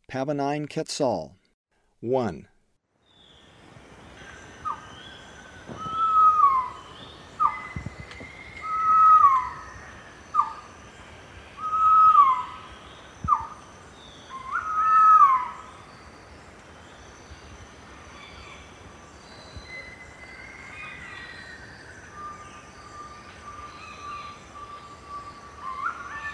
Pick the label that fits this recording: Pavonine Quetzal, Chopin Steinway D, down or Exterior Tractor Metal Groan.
Pavonine Quetzal